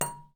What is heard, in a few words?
object drop bottle